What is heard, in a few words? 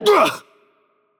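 Attack Heavy, Portuguese; bloom minigame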